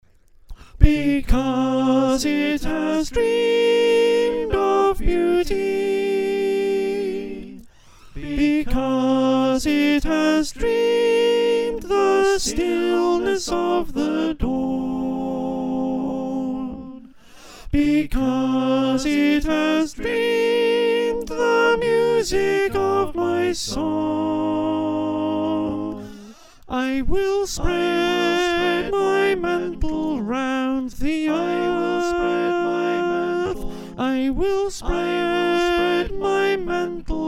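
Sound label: Soprano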